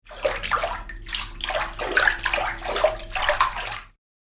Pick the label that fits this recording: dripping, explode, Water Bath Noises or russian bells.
Water Bath Noises